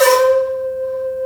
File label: SHAKU ATK C5; Big Fish Audio; Synth City